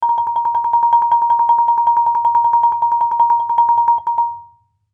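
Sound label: Marimba roll fortissimo Bb5; MIS Pitches - 2014; Percussion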